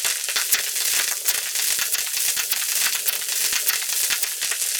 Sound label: Cracklature